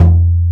BOBA, ETHNIC PERCUSION; AKAI S6000 CD-ROM - Volume 5

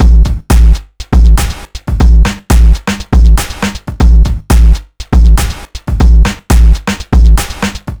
newmaticnees 120bpm, downbeat dance grooves

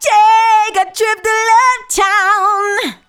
TAKE A TRIP, FEMALE VOICE; Best Service; Hallelujah

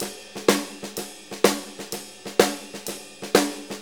Blues Loop, Drum Tools, Full Kit Loops

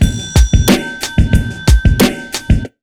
BEAT L, Pure Electro; USB Soundscan vol.03; AKAI

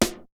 Snare; ZERO G - Datafile DISC 1

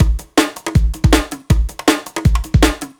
Drum Loops, 160; FeralCat; M8; Electronisounds

